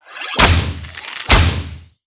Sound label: Archer Skill; Seer; GamigoNA